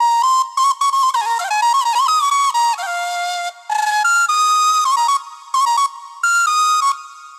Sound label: Koel Flute Loop BPM 130 A Min; Bihu; waves of bihu vol 2; Gully Loops